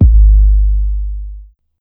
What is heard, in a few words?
Kick Pump It